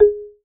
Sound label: Marimba, notifications